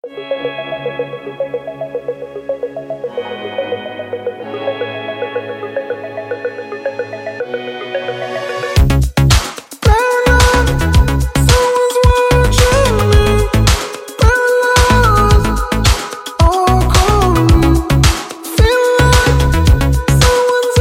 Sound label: hiphop